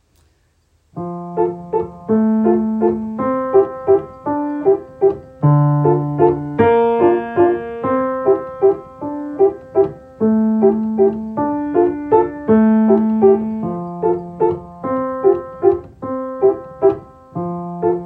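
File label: Skating at twilight, Piano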